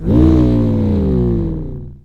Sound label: SFX Car Begin